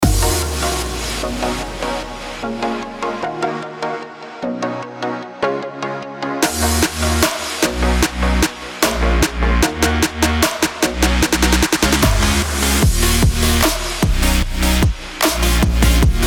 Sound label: MUSIC BED DUBSTEP UPBEAT HAPPY POSITIVE VIBE